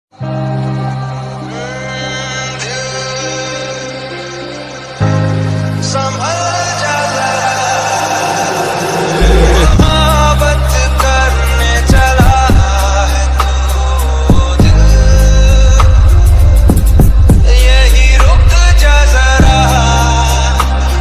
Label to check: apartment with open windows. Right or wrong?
Wrong